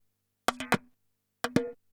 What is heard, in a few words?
PERCLOOP ETHNO 125